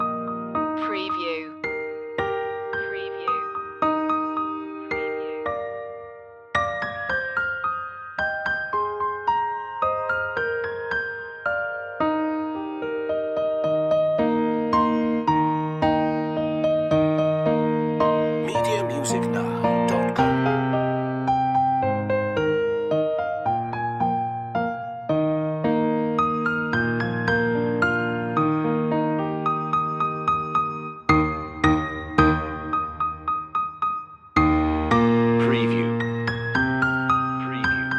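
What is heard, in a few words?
the holly and the ivy piano loop end, Christmas Traditional; media music now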